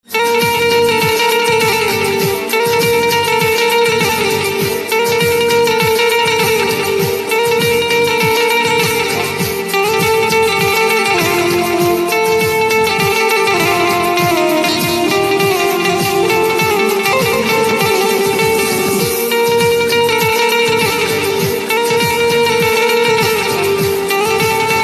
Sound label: Banjo Instrumental Ringtone